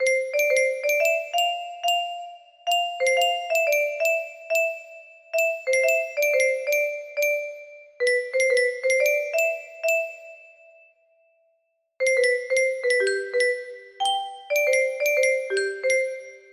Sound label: jingle, music box